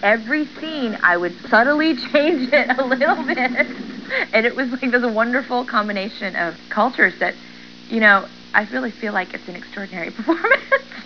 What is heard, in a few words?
funk